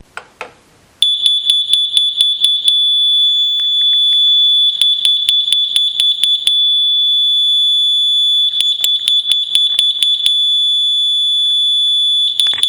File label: Water Warning Leak Detection Alarm Sound